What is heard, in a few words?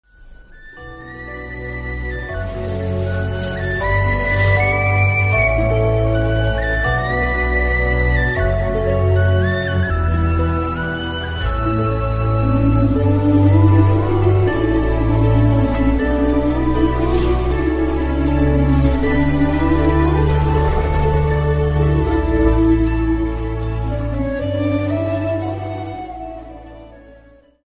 refreshing calm instrumental music tone; galaxy ringtones